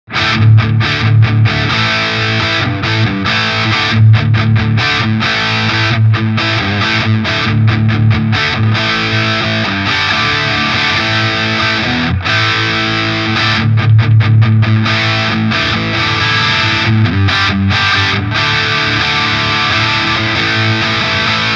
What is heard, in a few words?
Friedman Small Box Overdrive High Gain full Gain